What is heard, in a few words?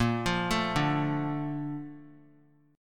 Bb Major 7th, chord; BbM7 arpeggiated; JGuitar